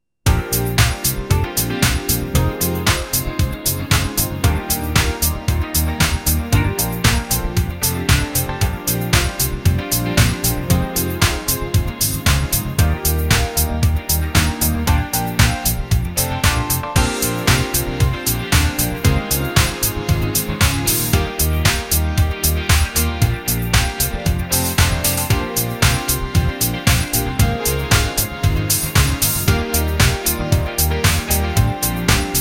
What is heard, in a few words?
Disco Driving Drum Machine Synthesizer Bass Piano Guitars Instrumental Clubby Euphoric; Chicago